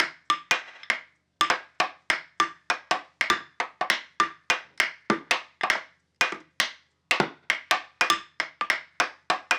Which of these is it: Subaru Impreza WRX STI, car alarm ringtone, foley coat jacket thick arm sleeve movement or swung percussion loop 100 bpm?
swung percussion loop 100 bpm